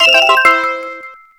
Star Coin All; Wii

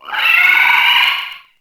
Bone Rollercoaster Screech; New Super Mario Bros Wii

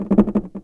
Item Chest Rattle; New Super Mario Bros. Wii

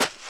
Land Quicksand

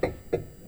Bone Rollercoaster Climb

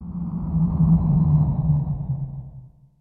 Ambience; Super Smash Bros

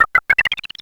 Platform Move; New Super Mario Bros. Wii

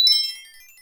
Cannon Sparkle

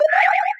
Spin Jump

